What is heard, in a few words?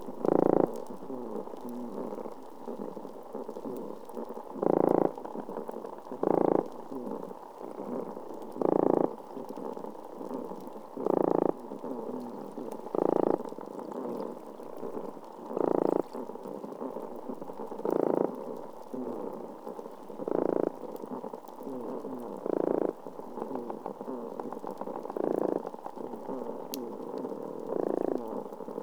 Fish Combo